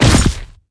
Footsteps; unreal development kit

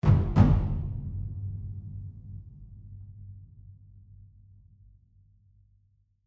Huge Drum Slams End Tag

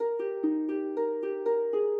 Harp

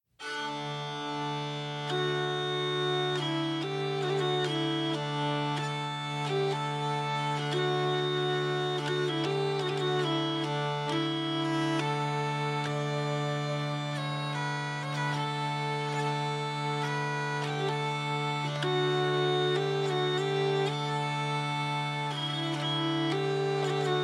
Tavern; Assassin's Creed Unity

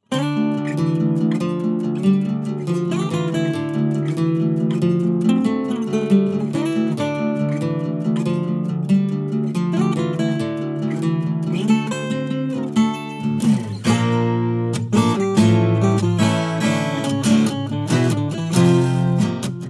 Acoustic; Interstice of the Dimension

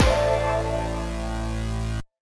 Retry Jingle (Pixel)

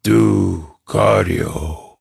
Better Zombies Upg; Fallen Ones; Grim Cemetery

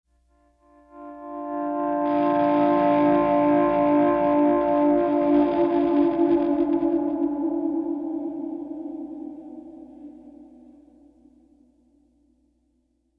Pleasant Guitar

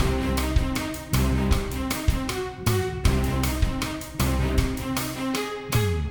City Platforming MIXED; ben 10

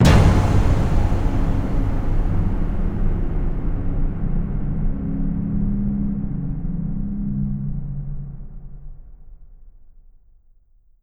stinger danger; Castle on the Coast; PS4

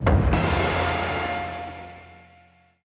Jingle, Failure

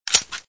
pistol slide; gamerip; Gun Mayhem

